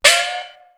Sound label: CHINOPR GONG 2; East West; Global Sounds